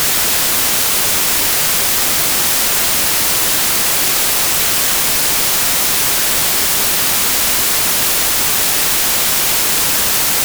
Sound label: White